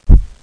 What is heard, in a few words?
Thump